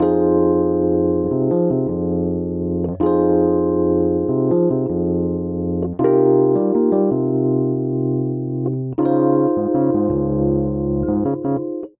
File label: Vamp Soulful RnB i - v - VI F Minor 80 bpm, Electric Keyboards; Ableton; Factory Packs